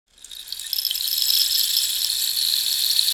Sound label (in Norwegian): L 50, regnstav av kaktus 50 cm; regnstav av kaktus 50 cm o 6-8 cm